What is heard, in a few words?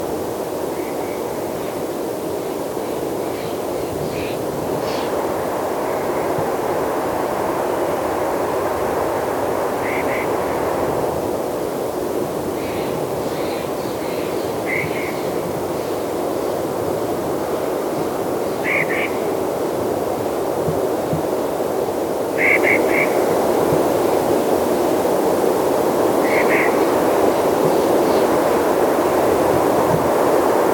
Clark's-nutcracker, پرنده فندق شکن کلارک